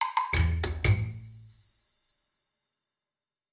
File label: Sting Secret found